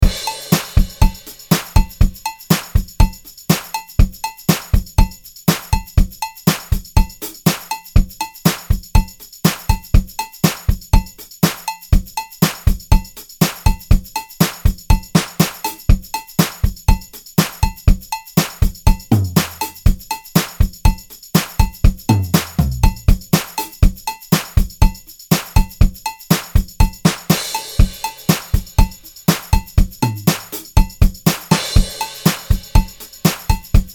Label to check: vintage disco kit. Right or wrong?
Right